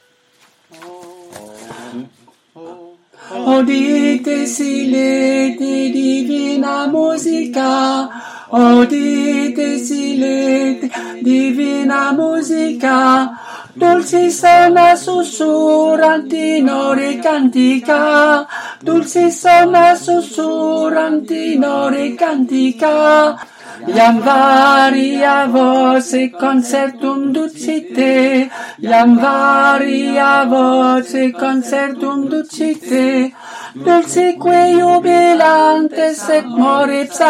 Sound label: tenor, chorale; Audite silete